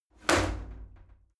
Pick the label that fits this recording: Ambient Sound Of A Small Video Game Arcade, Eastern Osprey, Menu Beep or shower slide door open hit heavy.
shower slide door open hit heavy